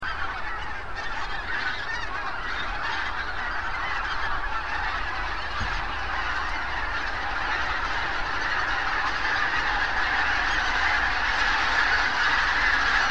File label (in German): gaense